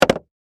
Skateboard Land, Sports, Skateboard, Land, Wood, Surface; Skateboarding